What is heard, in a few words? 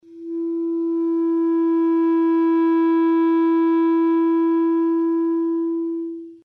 F sharp 4, clarinet